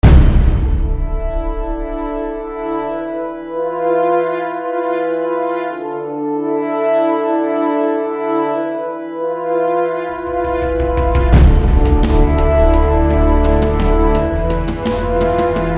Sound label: Epic Menu Theme; Apocryph: An Old-School Shooter